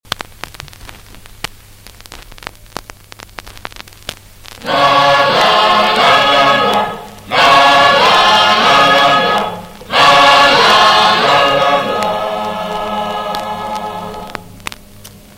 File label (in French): Indicatif de la chorale; Madagascar